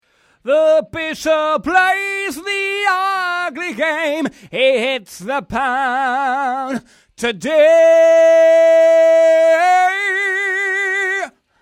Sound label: Vocal Test